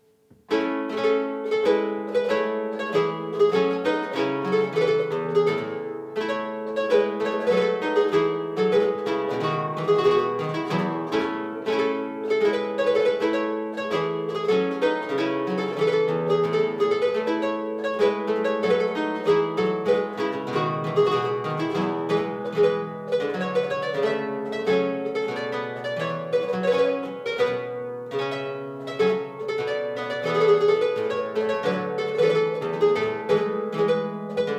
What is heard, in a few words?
Suite B flat Bouree, harpsichord; Johann Pachelbel - Bouree (2x8') - Performed by Sebastian Bottone; Sorli Harpsichords and Lautenwercke